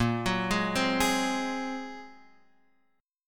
Bb 7th Suspended 2nd Sharp 5th Bb, Guitar Half Step Down; Bb7sus2#5